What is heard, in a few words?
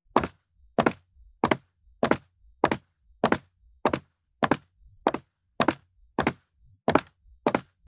Concrete Footsteps; horror; horror sfx vol 1